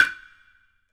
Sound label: OHTSU, TUZUMI; AKAI S6000 CD-ROM - Volume 5